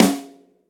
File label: Long Tail Reverb Snare Drum Sample C# Key, Royality free snare tuned to the C# note